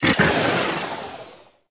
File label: Area Defense Timer Paused; Warframe; Nokko Colony